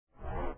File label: Windows XP Restore